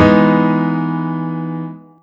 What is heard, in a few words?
Four E G# B3 D#4; osu